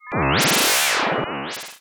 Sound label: Sci-Fi Electronic Energy Warble; Science Fiction